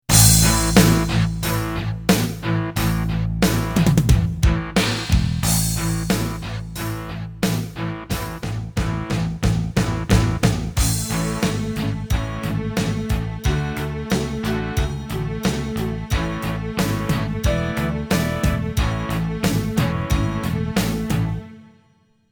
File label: Smooth A